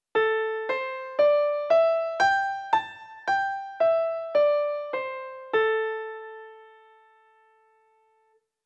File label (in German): A-Moll Pentatonik Modus-A der C-Dur Pentatonik